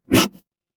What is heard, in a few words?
cartoon squeak